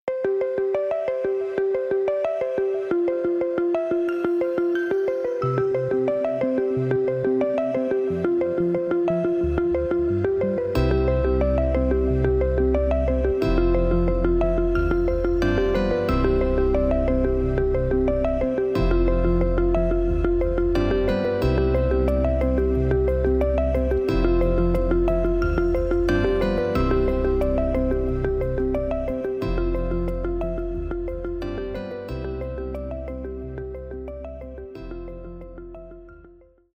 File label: original score